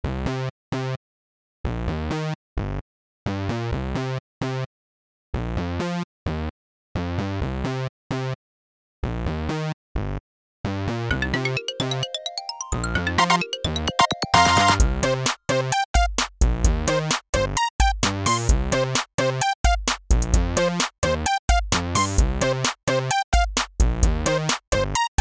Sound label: In-Game Theme, Robotic Version; quadrapop mobile gamerip 2005